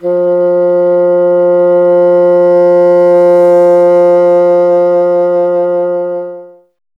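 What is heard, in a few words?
wind bassoon F; Miroslav Vitous - Solo Instruments VOL-1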